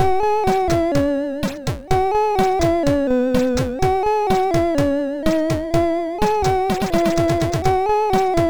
Music Imitation sid MOS6581R3

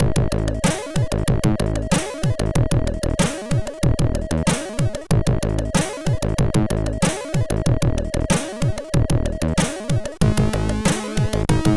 Dumpbass, sid MOS6581R3

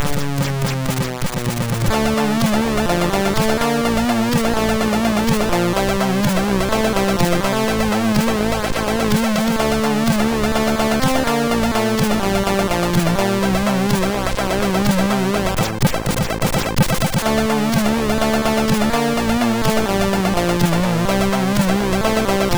POP Intro, sid MOS6581R2

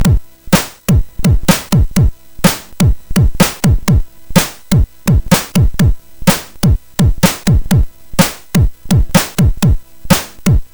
Live, MOS6581R4

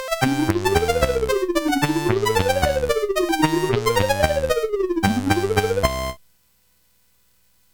Ducks Ahoy, CSG8580R5

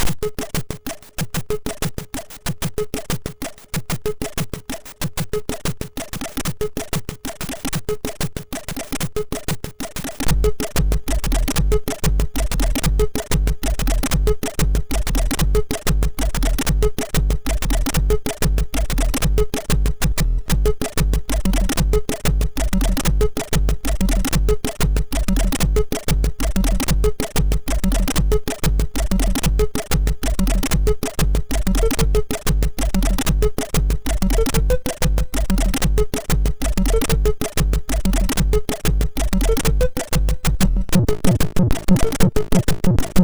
Jungle Beat, MOS6581R2

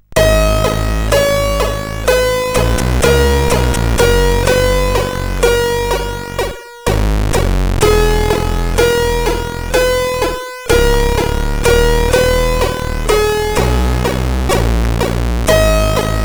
sid CSG8580

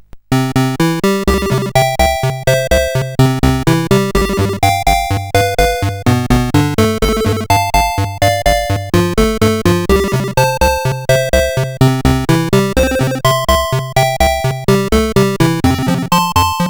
sid 8580; CSG8580R5; Manic Miner 64DX

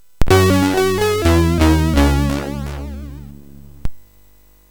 MOS6581R4, GAMES; Solitaire

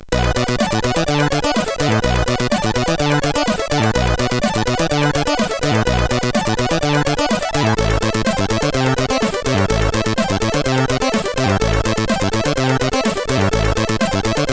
Bass, CSG8580R5

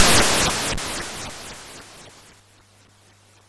Frantic, MOS6581R4

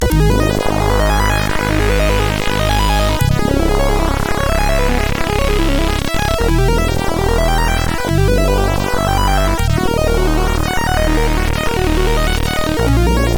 Crack Intro, CSG8580R5

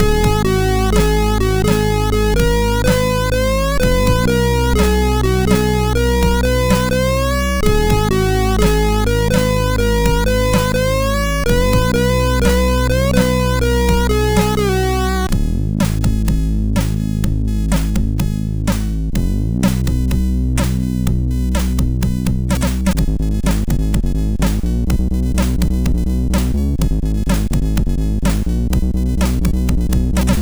Disco Guitar, MOS6581R4

